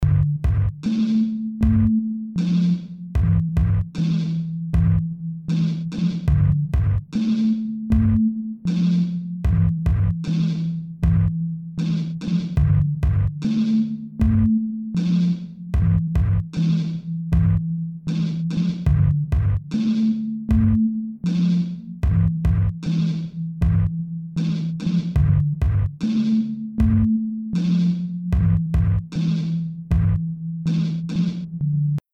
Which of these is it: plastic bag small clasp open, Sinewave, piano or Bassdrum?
Sinewave